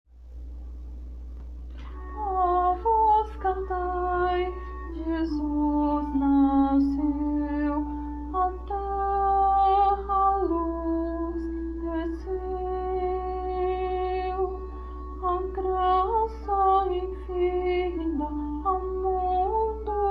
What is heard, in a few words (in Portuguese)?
contralto